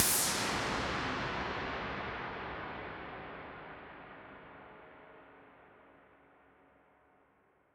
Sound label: Noise Verb Decays; stereo toolkit samples